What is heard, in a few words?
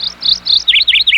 Bird, environments; Sonic Foundry (Sony Creative Software); Ambient Grooves